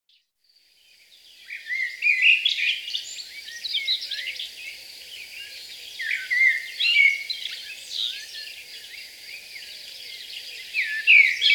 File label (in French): foret au reveil